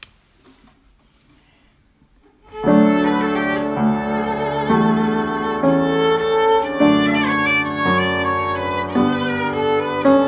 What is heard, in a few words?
Oboe